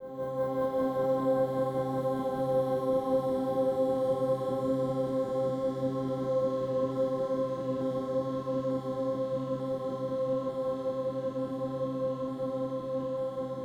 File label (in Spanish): Loops mutantes